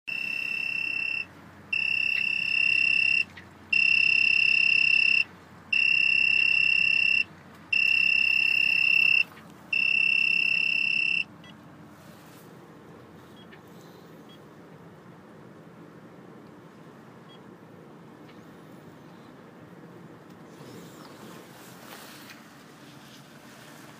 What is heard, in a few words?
Dragging anchor